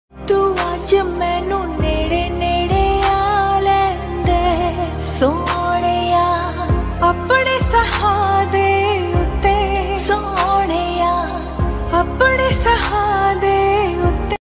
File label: ringtone beautifull love song latest punjabi ringtone